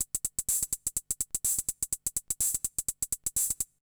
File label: HIHAT HOUSE 125, Drum; eJay